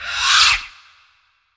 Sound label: scratch wet; Q Up Arts; Denny Jaeger Private Collection